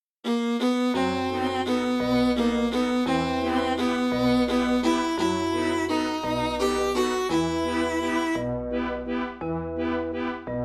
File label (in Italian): Occhi Belli